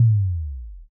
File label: kick